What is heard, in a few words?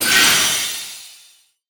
mirror, Death